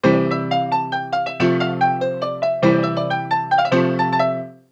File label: Keys Faster